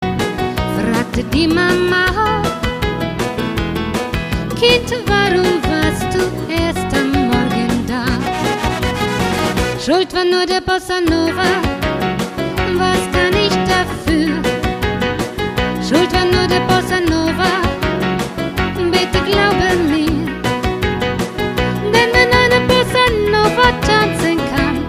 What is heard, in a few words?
Bossanova